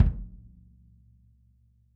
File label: kick